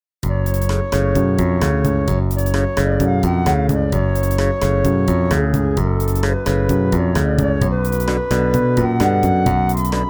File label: Arabian Blues; spellman studio